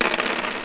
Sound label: ROLL